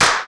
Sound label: PRC GROUP, Clap; Roland L-CD701; Clap & Snap; Clap Modules